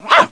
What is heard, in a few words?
BARK, dog; dogz